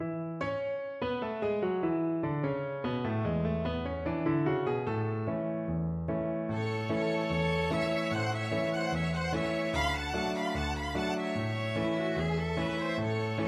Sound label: the easy winners violin